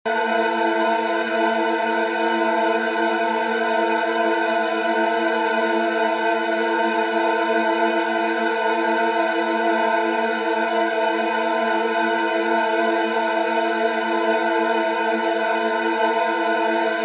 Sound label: static quartet